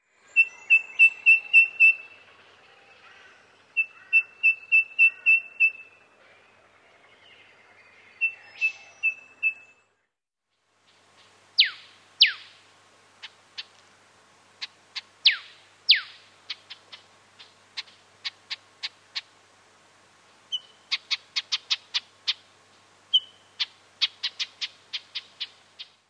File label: Eastern Yellow Robin; rainforest songbird; Australia; Unit 02 - Bush Turkey's Tea; Pale Yellow Robin; I like to live in prickly vines or in epiphytes